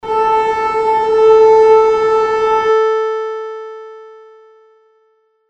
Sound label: string model